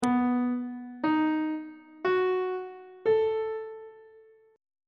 c flat mixolydian 7th chord 1 is c flat dominant 7th on treble clef